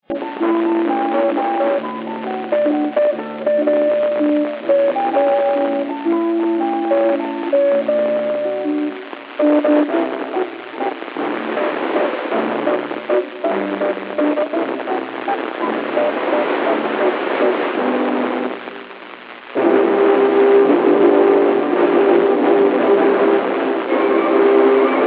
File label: 6m; CE Muzak 48.300; CE Muzak are FM broadcast stations in Chile, often used as a propagation ind; N1BUG